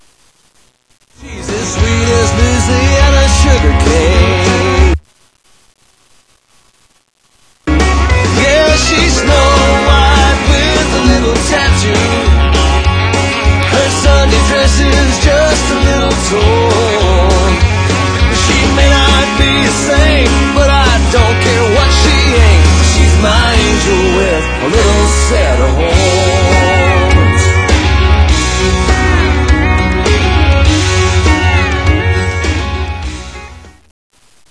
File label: Little Set of Horns; Willmon